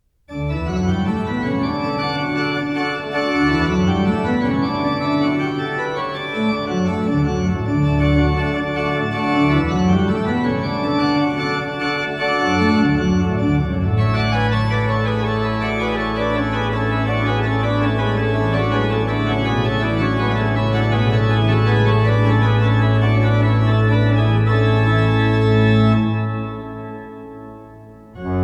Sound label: Prelude in D major, Bach Great Organ Works; Prelude Fugue in D major, BWV532 - I. Prelude; Johann Sebastian Bach